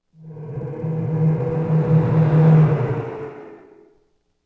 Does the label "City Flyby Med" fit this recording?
Yes